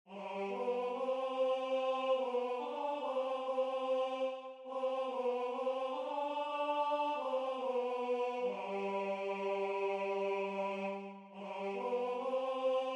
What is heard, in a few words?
Alto